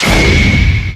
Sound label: transformation